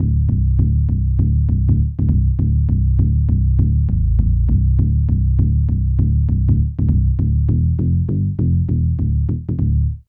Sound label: BASS LP